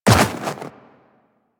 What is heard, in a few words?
Run Snow, Footsteps; Warframe; Tenno